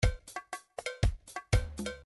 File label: calypso, midi